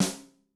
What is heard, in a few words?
Fat looz, Yamaha DTXpress-II snare